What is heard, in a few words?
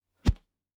Punch Swoosh Thud; Hit Whooshes